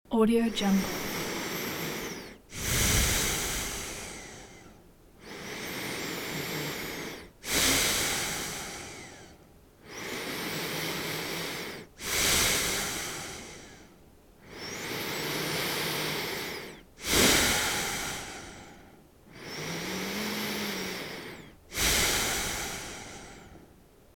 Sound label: deep nose breathing; نفس های عمیق مرد; human sounds